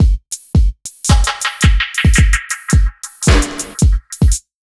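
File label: 110 beat, Ragga & Dub Reggae; USB Soundscan vol.27; AKAI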